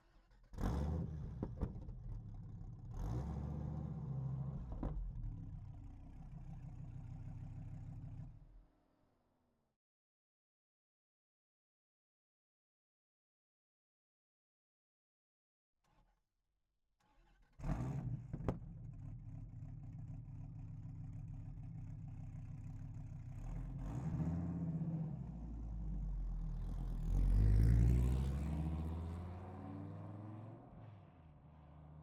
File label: Dodge Viper GTS Ext Medium Various Wide; Pole Position; Dodge Viper GTS 2014; CMC6